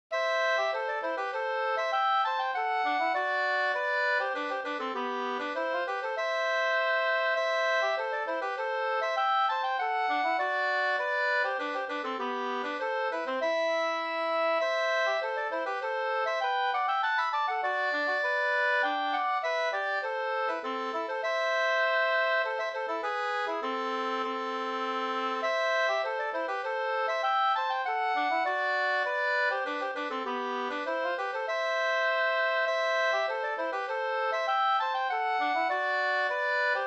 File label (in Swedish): polska vispolska